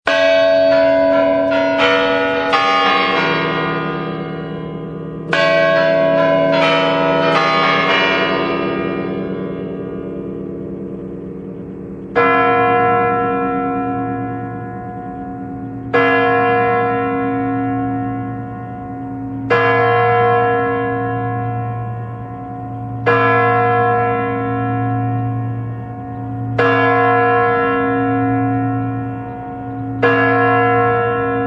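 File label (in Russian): новый год часы на Спасской башне Кремля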